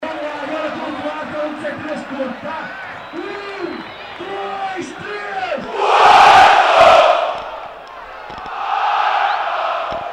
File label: PORTO, Chants